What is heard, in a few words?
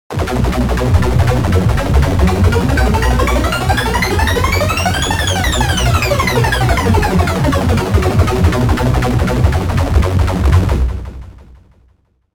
Techno Trax